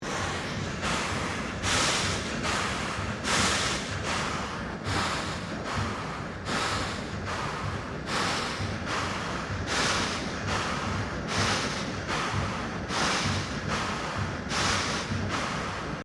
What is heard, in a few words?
Steam Engine Tandem Verticle 1877 Steam Output Loop, Colonial era machinery; PHOENIX